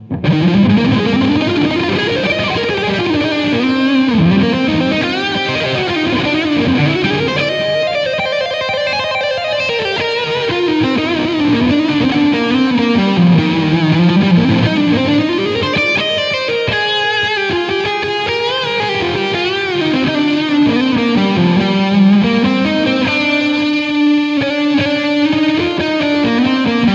Liquid Solo mic

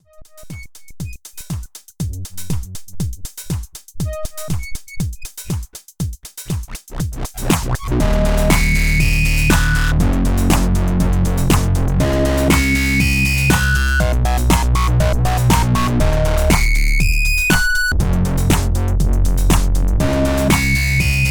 Novation Circuit Mono Station; Factory Session

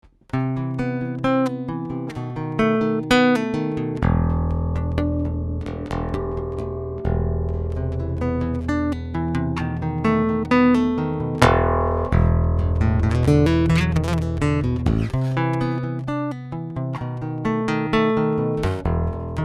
Robot 4 Chords´n Harmonics

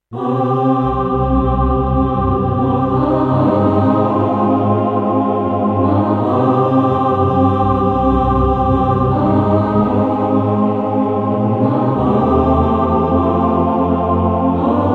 12 Bit Choir

